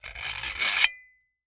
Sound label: Industry Tool Caulk Gun Creaks